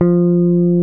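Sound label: F3 FINGER BASS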